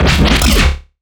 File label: Riddim Bass Hit; One-Shots; Bass Hits; Gazer; Ghosthack; Riddim Essentials Volume 2